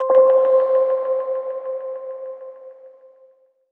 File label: KEYS (C); One Shots; Tiny Ghost; Cymatics; Mystery Pack Vol 4